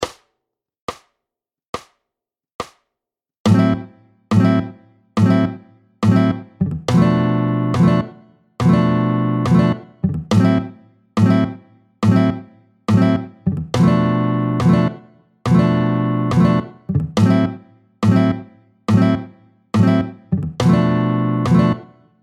Pompes tempo 70; 16-06 La pompe, tempo 70; La pompe lent tempo 70

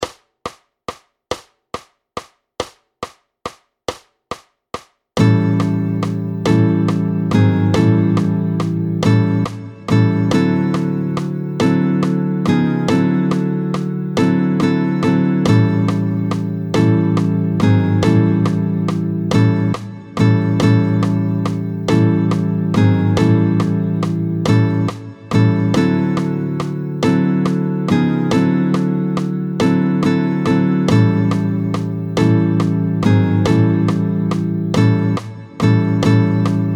Petit blues ternaire; rythmes binaires ternaires, l'interprétation ternaire

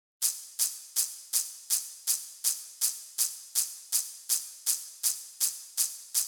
Charley, Charley isolée; C'est la double cymbale que le batteur actionne avec le pied.

